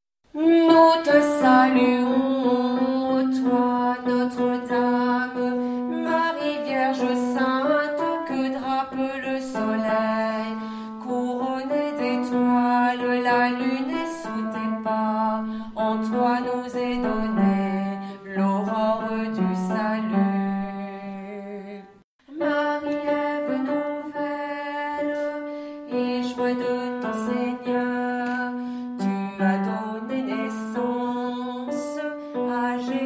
- Tenor

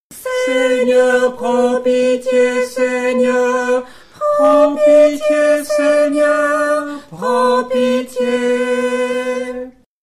Seigneur Prends Pitie Tenor

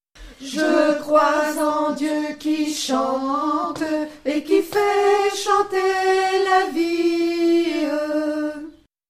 Je crois en Dieu qui chante Alto

